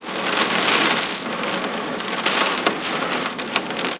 rain

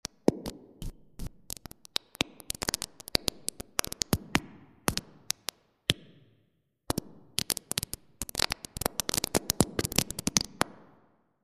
Large hall dark; .mp3 file - 100% wet; freeverb

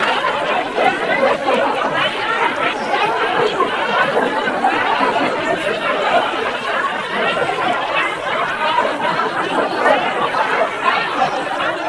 laughter

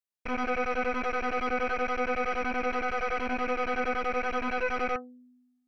Tremolo

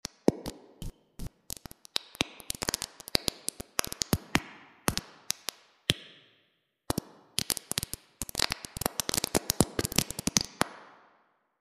Large Hall Bright; .mp3 file - 100% wet; freeverb

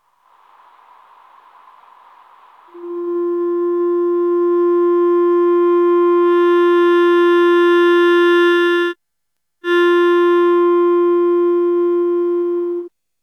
Clarinet; Add clamp

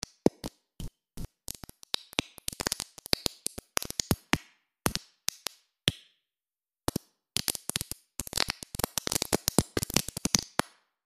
Small room bright; freeverb

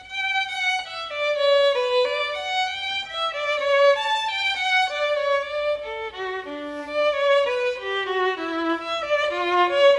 violin; violon

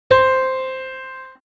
piano extract

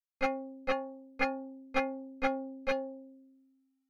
Inharmonicity

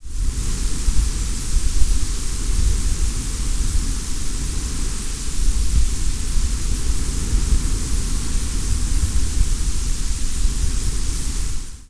wind synth

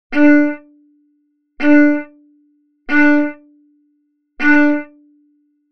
Martele

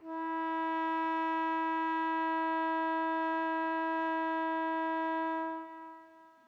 s sax trumpet; interpolator

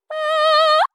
release Up

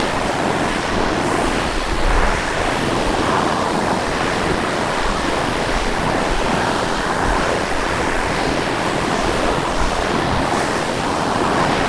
waves

